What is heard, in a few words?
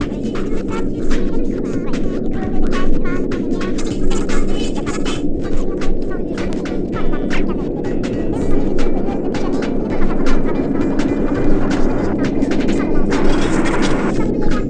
FEAR FX; E-Lab; X Static Goldmine 2; akai